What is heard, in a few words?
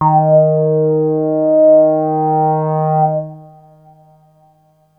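bass D#1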